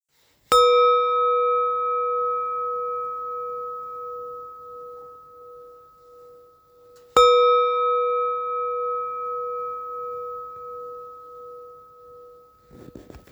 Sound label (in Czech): 568 zen koan, tibetska misa zen koan 568g